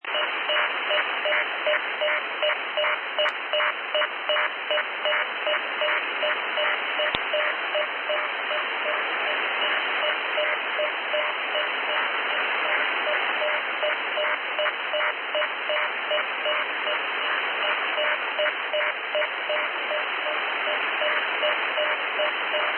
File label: Link 11 example